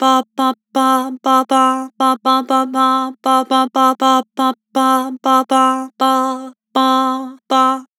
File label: Vibrato "Bah", Vocals; Harmor Vocals